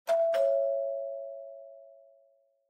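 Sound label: Doorbell Mechanical Tone Far; ドアベル 機械式 トーン; 遠い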